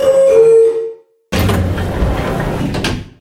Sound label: Elevator Doors Open